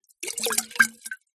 food drink wine bottle shake lid off wine inside; zapsplat

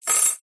household keys bunch set down on wood; zapsplat

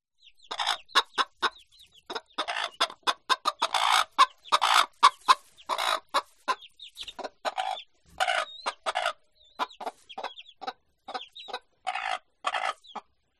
Bird Farm Chickens; audio hero

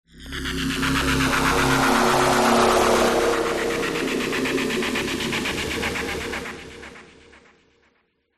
sound design swell sweep distorted digital fm; zapsplat

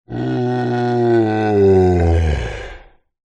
fantasy giant male yawn

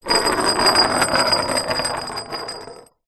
metal bar small roll marble surface; foley

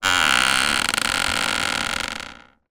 office chair creak; zapsplat

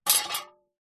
warfare sword small set down on hard surface

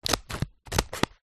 office scissors cut paper close up; zapsplat

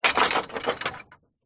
household gate wooden close shut bolt noise; zapsplat

